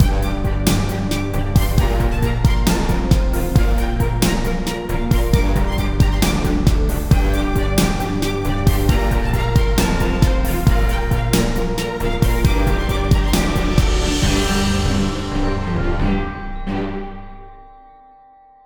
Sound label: Intense Symph